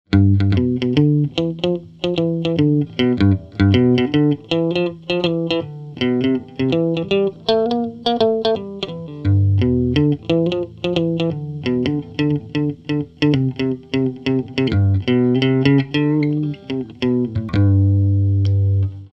amp head muted 12 bar clean; dr zee se cdc amp head

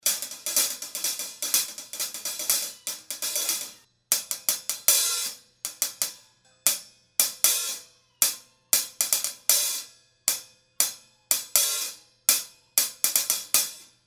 paiste 2002 heavy hihats 14

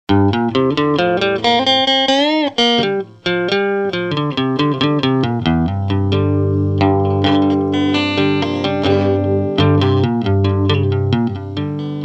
amp head clean improvisation; dr zee se cdc amp head

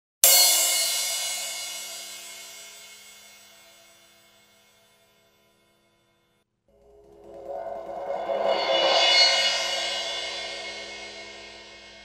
sabian b8 crash 16

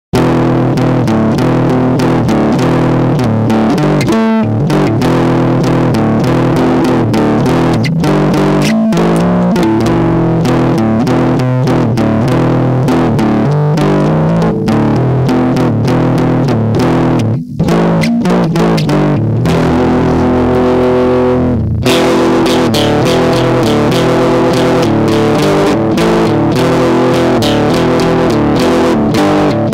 trash trashier, amp head; dr zee se cdc amp head

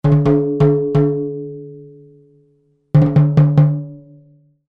cb700 steel snare timbale

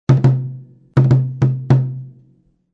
tom 10

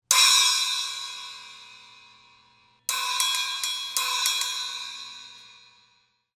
zildjian splash 8

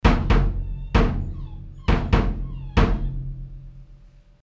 second bass drum 22

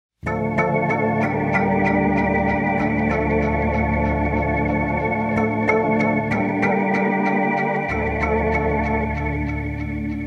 organ sad song

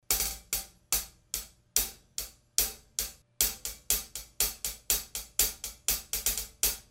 sabian b8 hihats 14

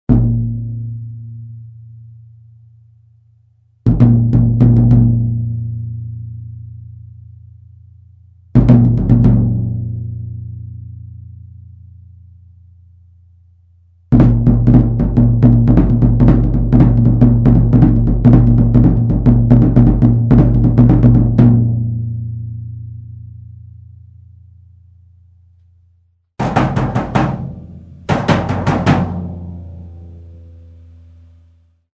1953 Gretsch floor tom 16

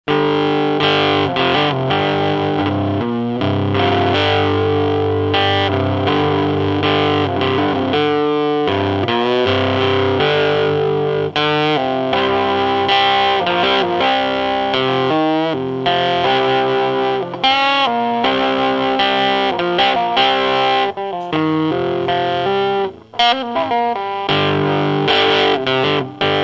amp head dirty riff; dr zee se cdc amp head

